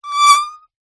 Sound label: cartoon mosquito fly by; zapsplat